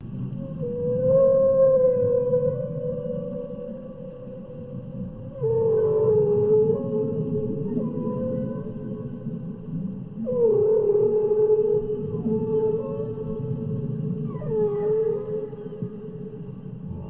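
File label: blue whale; zvuki kitov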